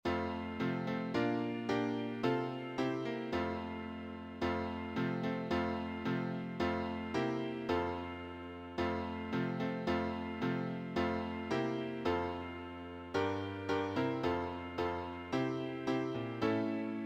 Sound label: piano acc; church and sunday school music; Hymns